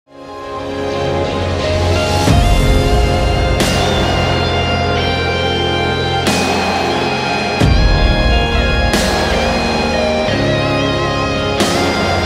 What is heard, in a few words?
instrumental